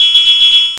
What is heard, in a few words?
RING